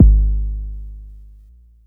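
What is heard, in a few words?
Long bass drum, Jomox Airbase99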